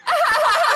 ninja laughing

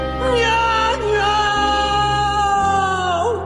o oooo

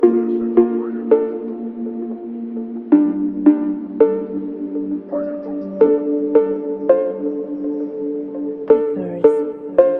slow reverb; titan; 2021 meme

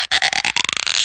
rubbery creak

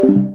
deafen; discord

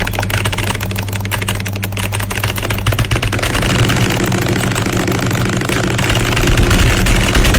keyboard rage

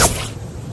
spider web

